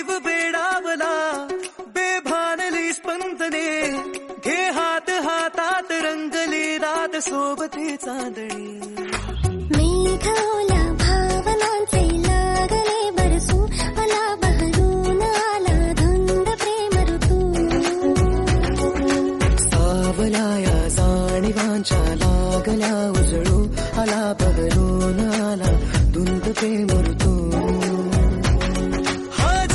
romantic marathi

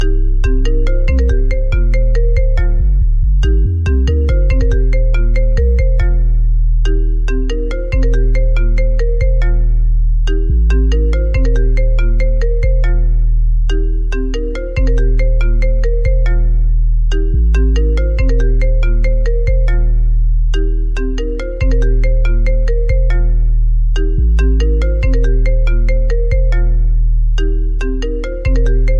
phones

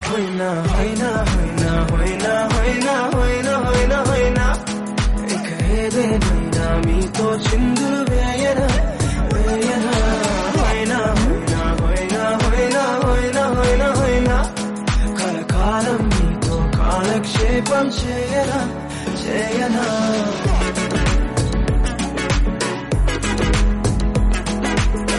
telugu song

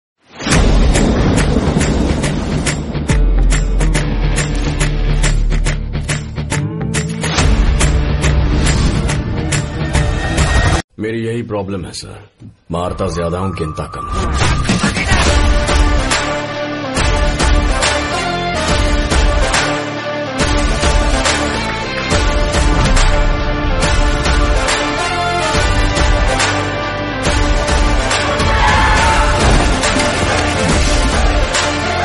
bgm, attitude, south; Sarrainodu

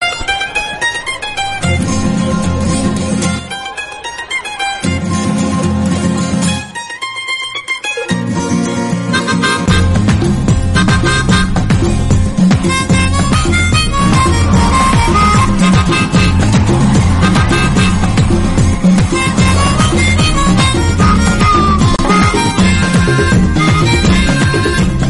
background music

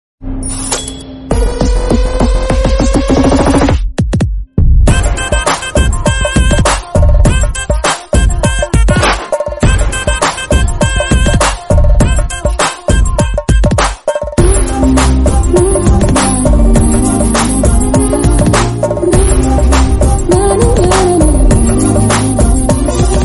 bgm; mr majnu

